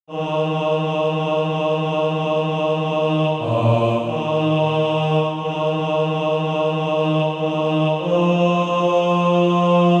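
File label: bass, SATB Practise Music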